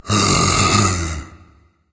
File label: content berserker; cuchulainn